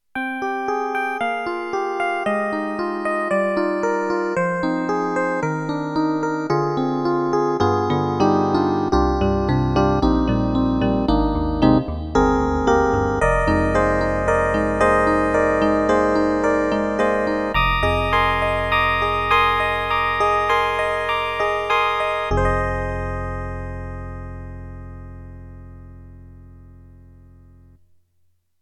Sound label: CASIO GZ-50M ELEC PIANO